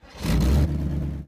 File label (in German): auto anlassen; minecraft